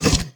Monster Effort Small; Monster Lizzie Effort Small

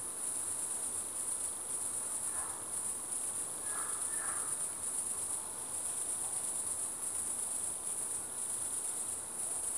Cicadas Weird Loop; Academy

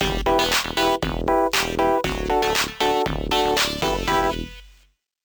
Transition; Wizards of Waverly Place Spellbound; Spellbound (DS) (gamerip) (2010); NDS

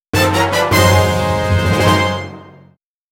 Item Acquired; the legend of zelda the wind waker hd

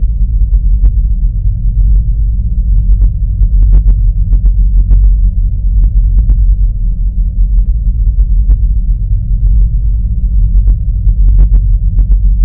Amb Space station; Fur Fighters

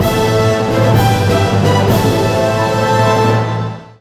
Survival Win

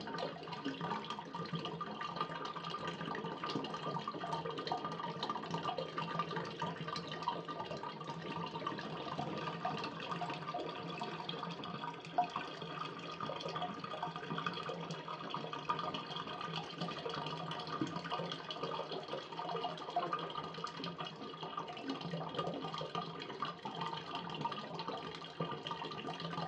Env Pipes Water Loop

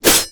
Spear Attack; unsighted

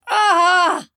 Mantis Lord Death

